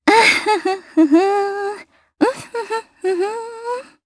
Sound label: Hum, jp; Yanne; kingsraid